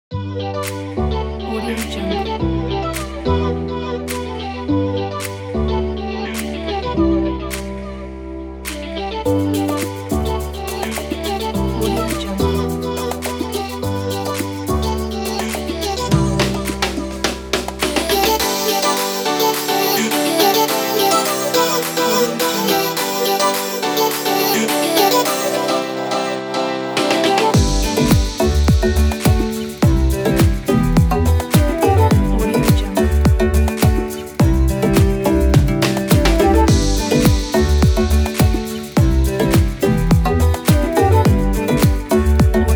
summer tropical pop dance

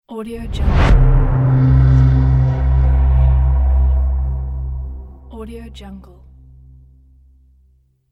movie transition

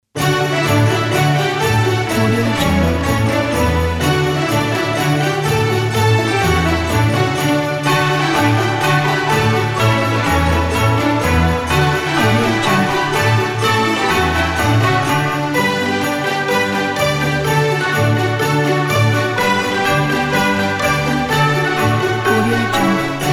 happy christmas time

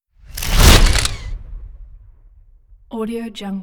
camera surreal blasting whoosh by shutter

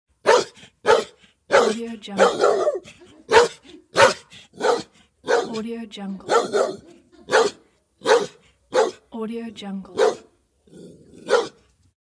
angry dog

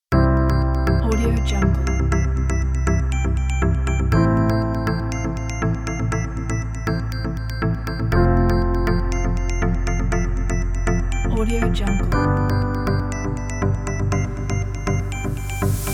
upbeat source

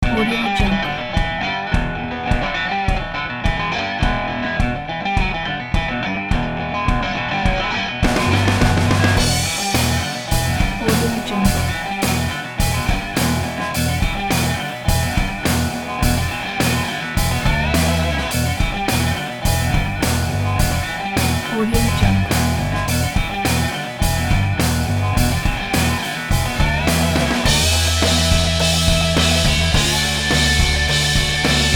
outlaw country

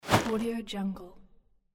rope

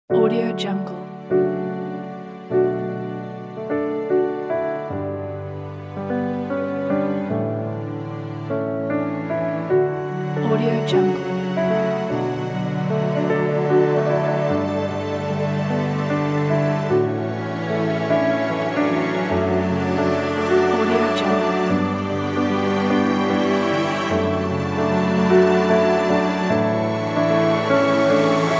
romantic wedding

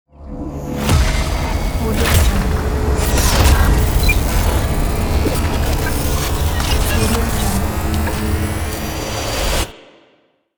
glitch logo

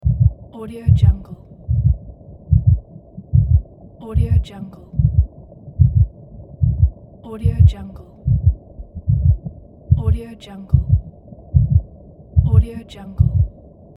baby heartbeat inside womb

